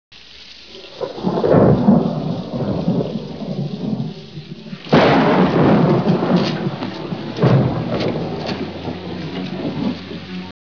thunder

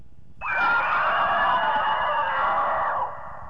Gyaos Roar; Gamera

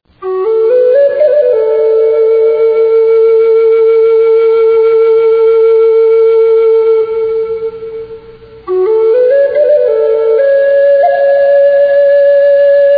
Distant Flute, nativeamerican

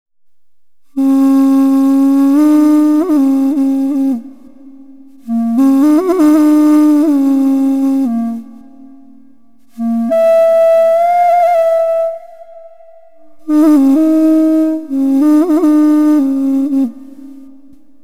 bendy reed wet; cyberwhistle

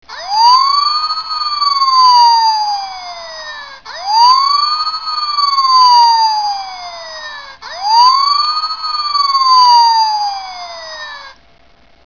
siren long; optimus prime

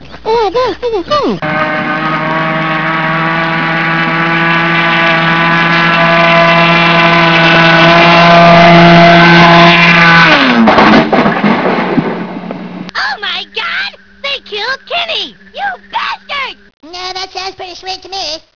AIRBOAT CRASH

